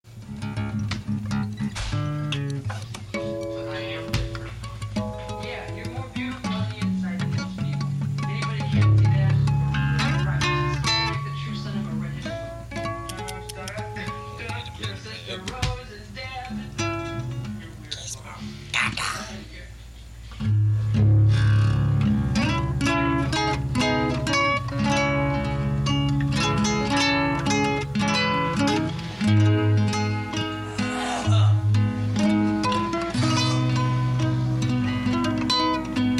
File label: Riff Tapes